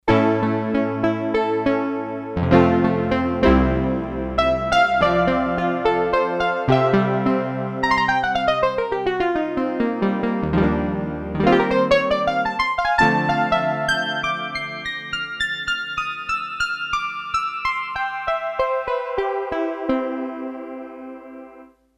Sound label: Elec Piano, Roland D-10; Factory Patches